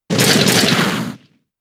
Crunch; pokemon sfx gen 7 attack moves sumo usum

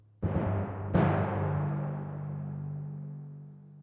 Tymp

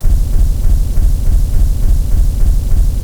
final hit; gg radiance

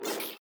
not fit

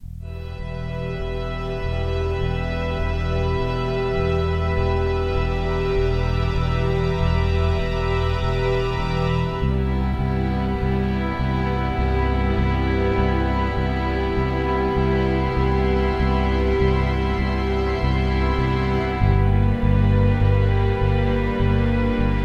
music ambient; overlive zombie survival rpg android game music

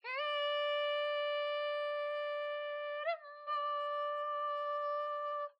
Kulning; bramble the mountain king; ps4 ps5 switch windows xbox one xbox series xs

